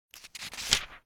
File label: page flip